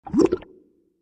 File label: Bubble Pop Slow Wet; bubbles